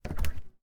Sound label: refrigerator open